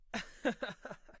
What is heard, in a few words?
haha